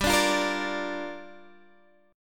Bb 6th G

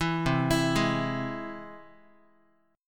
C Augmented down Guitar; arpeggiated; JGuitar

